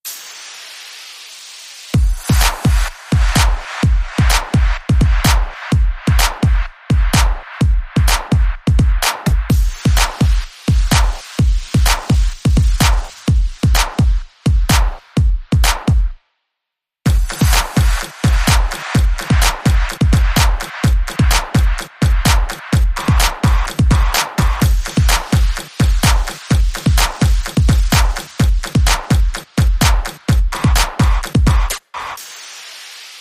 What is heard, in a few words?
Beat